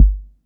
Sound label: mini dr; Roland MV8800; MACHINE KIT1